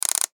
MECHANICAL GEAR SWITCH, Button, Mechanical, Fast, Click, Ascend; Production Element, Imaging Element, Accent, Transition; Multimedia; Blastwave